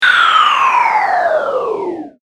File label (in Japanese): 下降